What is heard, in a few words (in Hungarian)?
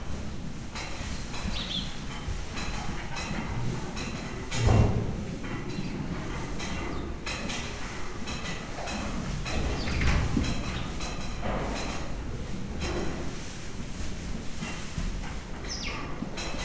önitatóból ivó csikó prüszkölések, ló; Hortobágyi Nemzeti Park 2013; máta